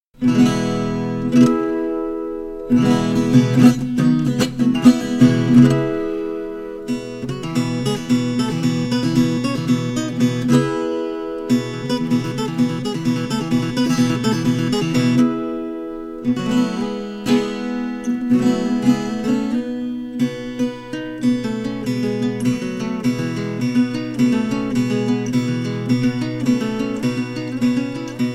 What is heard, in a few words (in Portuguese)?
improviso viola